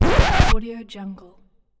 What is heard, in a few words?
record scratch